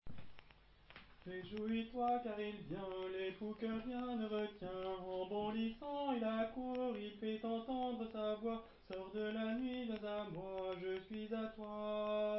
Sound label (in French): Voix de soprane homme